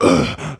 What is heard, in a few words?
Wheeze; Fitness; Neighbours From Hell Console Edition; GC, Xbox